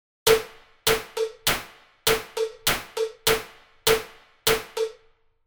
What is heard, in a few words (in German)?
Gehoer Rhythmus Beisp; Gehoerbildung